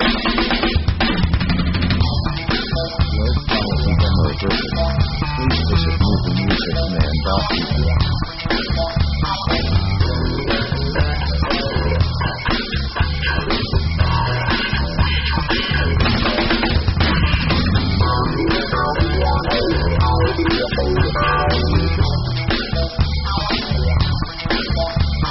funk rock e minor 120bpm; rock themes

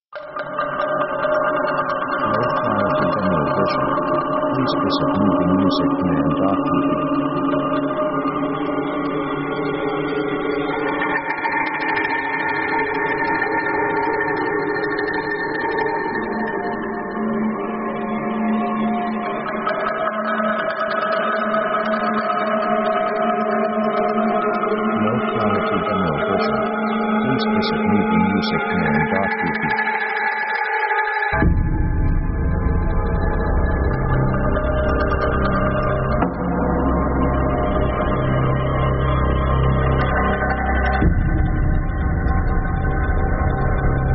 mysterious; synth themes

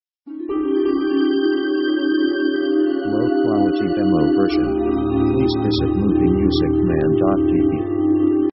dark shimmering; Dark mood

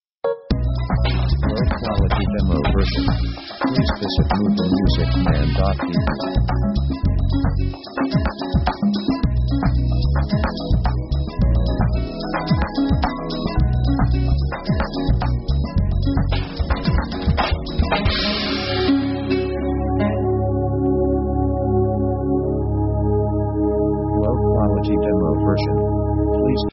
ethnic african; power themes

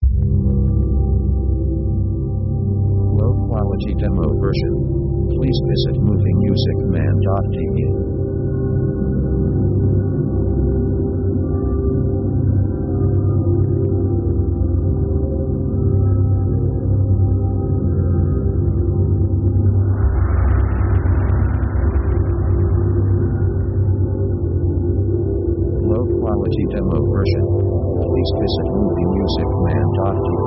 the great ocean, synth

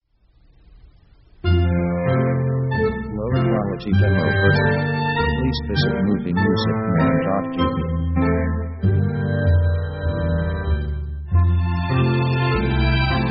a day in spring, easy

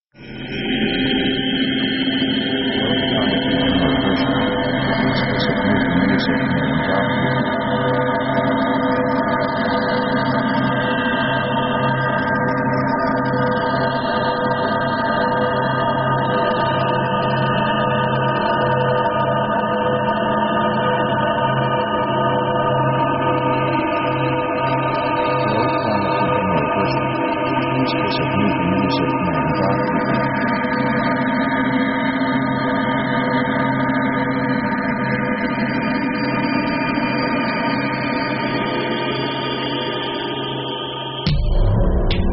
punching scifi; synth themes

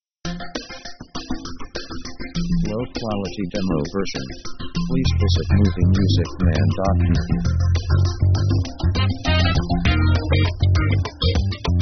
electro funk

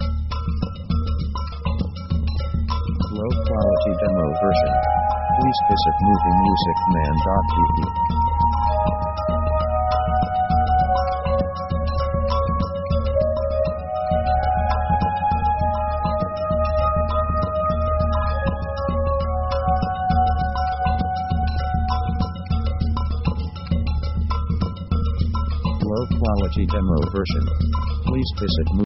african dream

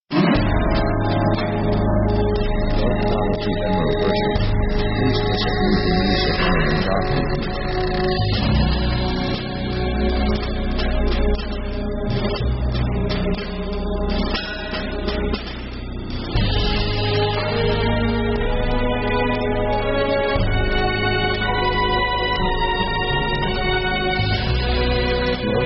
synth spooky heroic; Action themes

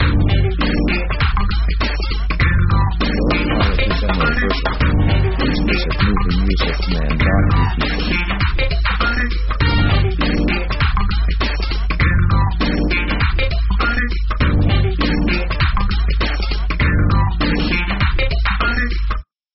hiphop funk; power themes

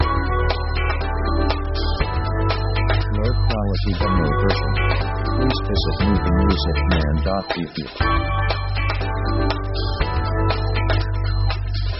jazz rock outta tune; dark; Dark mood

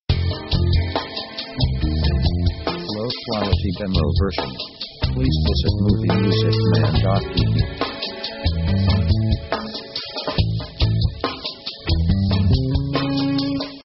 pop lazy mood 70bpm

